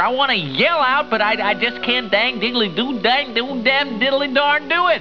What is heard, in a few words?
yell